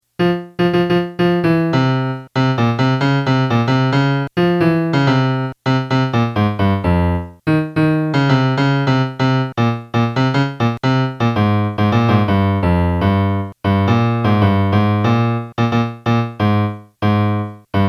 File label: melody; southern songs